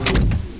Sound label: MENU CHANGE